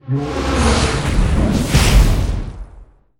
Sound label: manticore atk